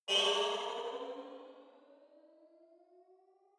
sci-fi vehicle turbo activate modulated; raumschiff

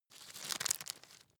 nut creak; haus garten; kueche

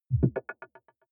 button echo marble bubble single; maschinen; signale; soundpool

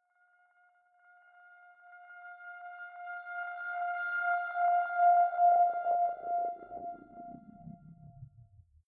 static string high; risers

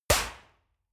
snap

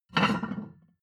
plate on plate take medium, restaurant cafe; sport-freizeit

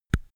microphone pop off soft; objekte; elektronik

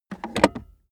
car accessories armrest close hard

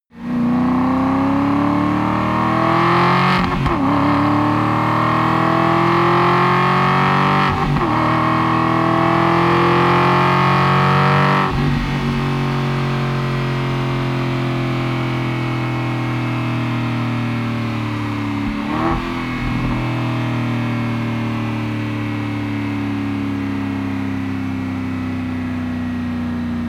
car aston martin acceleration long deceleration

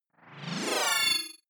chord mild surprise; swooshes-swishes

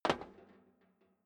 step boots metal rattle single stair down; Footsteps; schritte

